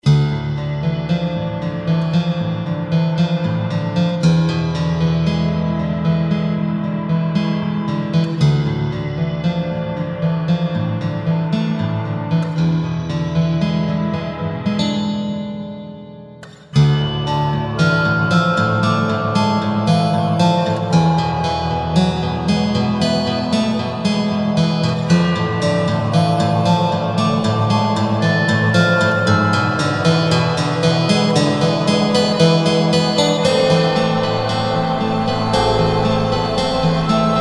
Haunted Village, acoustic, reflective

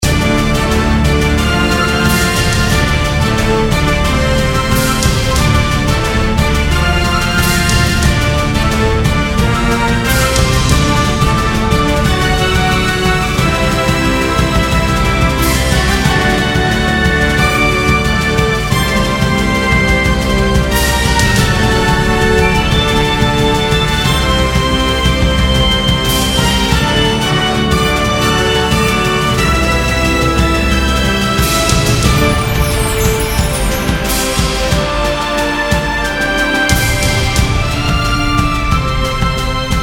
Majestic Battle, acoustic, energetic

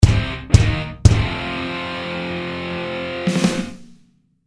Rock; stingers; energetic

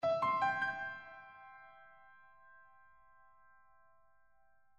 Piano; stingers; reflective

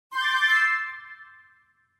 Flute; stingers; energetic

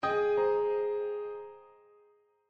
Piano; stingers; reflective

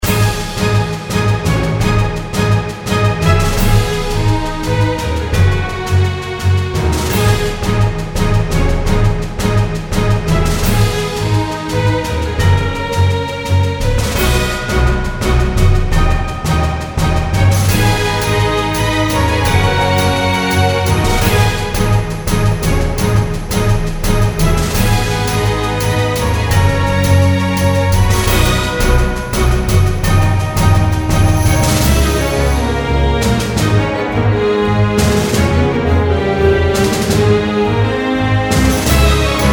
Fight to Win, acoustic, energetic

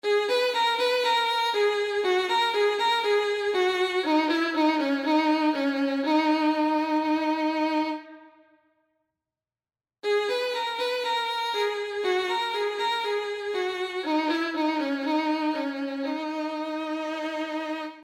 Violin

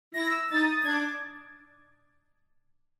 Flute; stingers; energetic

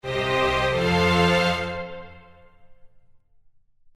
Orchestra

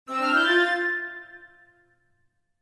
Flute; stingers; energetic

